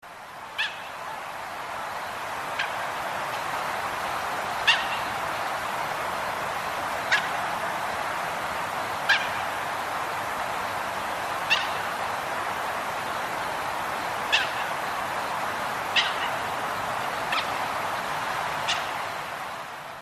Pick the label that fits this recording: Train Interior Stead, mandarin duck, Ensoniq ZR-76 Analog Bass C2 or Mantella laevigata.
mandarin duck